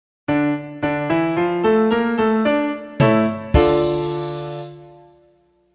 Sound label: Establish D Minor; McGraw-Hill